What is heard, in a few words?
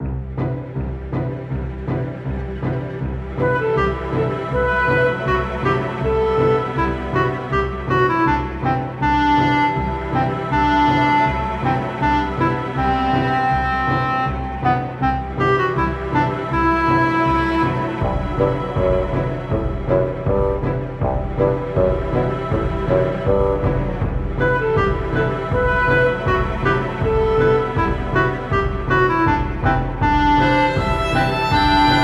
Forest Fairy Melody; Mario & Luigi: Paper Jam Bros.